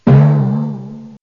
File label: warning; monsterz